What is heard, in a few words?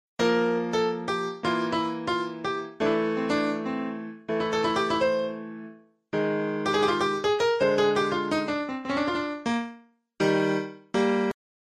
lost piano; transport tycoon